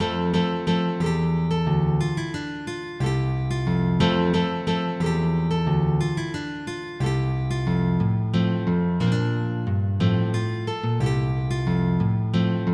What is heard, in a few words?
Sarabande, MIDI